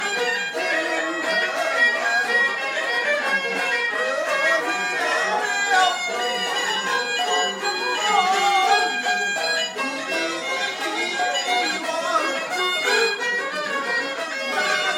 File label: beijing street music